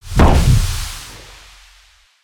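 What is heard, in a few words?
Shotgun Blast; Valiant Buccaneer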